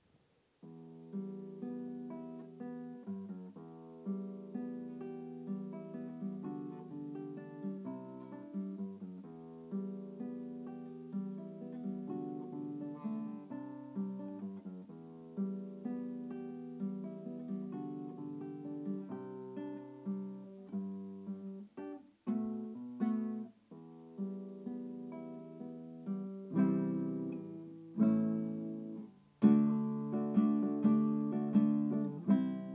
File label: mic recording; irig